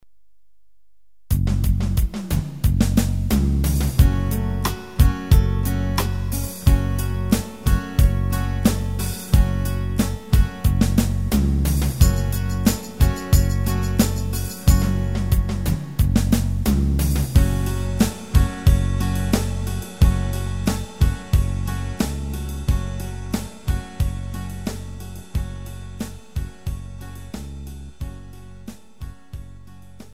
taverna slow; sx3000; ritim demo

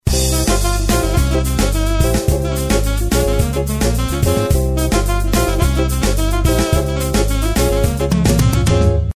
kesanli ritim denemeleri, gw8 ritim

